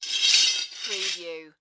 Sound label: Falling Tools